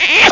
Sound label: kiss; Human